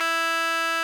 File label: RMI; Sonic Reality - Vintage Timetraveler